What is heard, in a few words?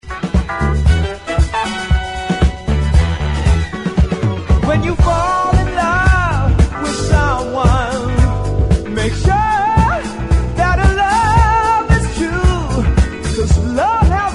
disco; 70s songs